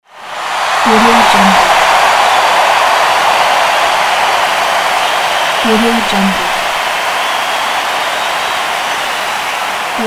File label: the stadium crowd cheering